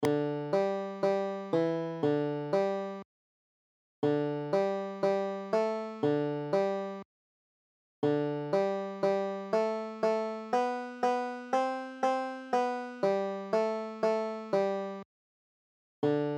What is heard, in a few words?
banjo